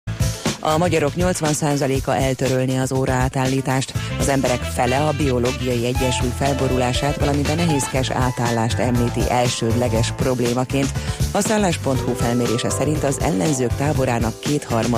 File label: Jazzy